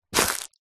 office document folder wallet plastic grab pick up